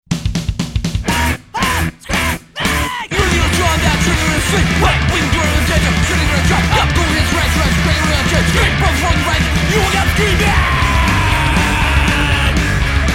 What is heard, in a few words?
Wake Up Screaming